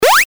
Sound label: jump